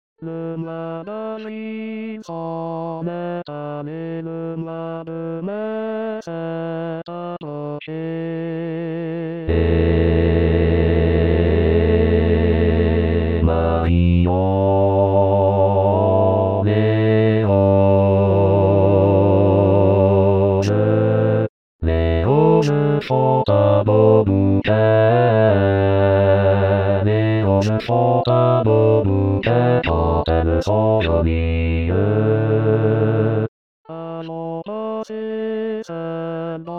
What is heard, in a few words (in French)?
marions les roses bas; 2023 st jean